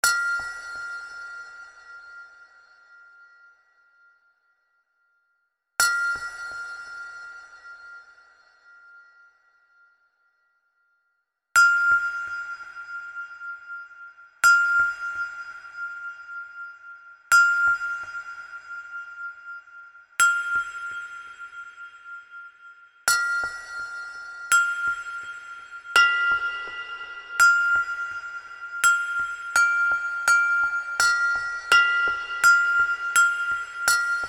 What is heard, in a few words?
progressive, alarms